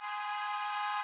car honk

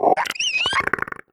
idle, headcrab black, creatures; vox

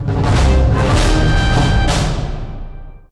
point captured; music

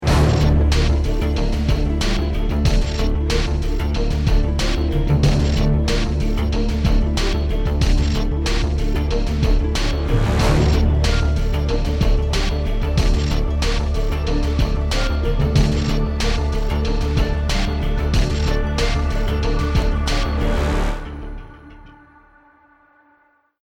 start round, music; valve; csgo

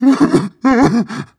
breath long, zombie

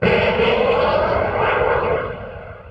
city battle; Half Life Model Pack; Half Life 2; ambient levels streetwar

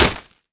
knife hit wall; Counter Strike Model Pack; CS:GO

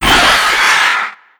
pain long, antlion; creatures; vox

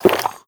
breath out, headcrab black; creatures

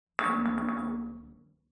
glass settle; ambient; amb oneshot

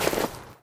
footsteps, snow; player

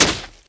near foot light, antlion guard; npc; Half Life 2; Episode 1

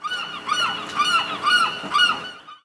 seagulls ambient, coast; Half Life Model Pack; Half Life 2; ambient levels

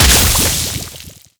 poison projectile explode

